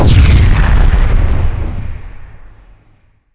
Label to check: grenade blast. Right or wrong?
Right